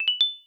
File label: ding up